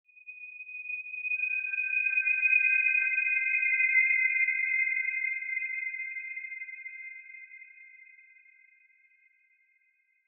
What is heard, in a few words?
Horror Musical Melody Haunting Ghostly High; Scary Soundscapes; Blastwave